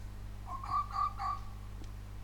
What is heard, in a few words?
Fox bark